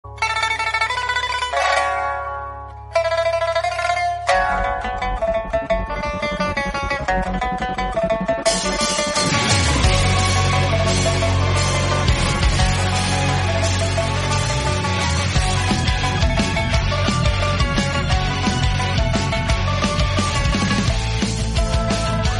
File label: guitar instrumental; iron man 3